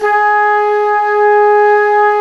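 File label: WND, Hybrid Winds, Flute & Flugel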